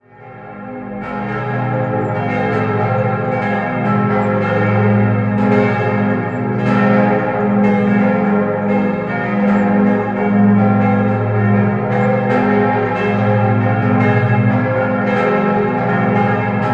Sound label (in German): Donauwörth, Glocken